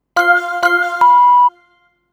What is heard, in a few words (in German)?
SoundLogo 2 Toene; Soundlogos für Ihr Corporate Design